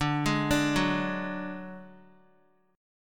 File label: D Major Flat 5th D Guitar, chord; arpeggiated; JGuitar